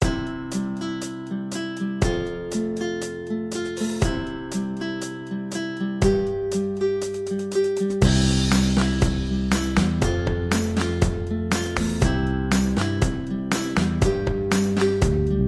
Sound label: Kids Song